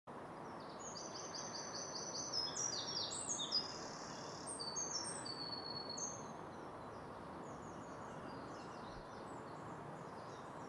dawn birds mainly wrens with parakeets at the end birdsong